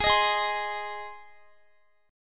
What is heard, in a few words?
Ab Major Ab down Guitar; Ab strummed